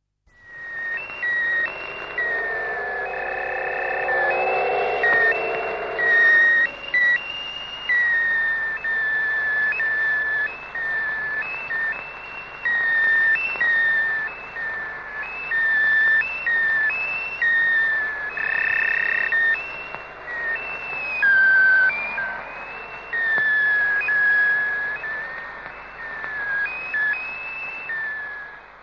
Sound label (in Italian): EFFETTO ELETTRONICO